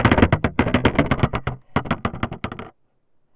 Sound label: Corrigated Iron, contact mic, Metalics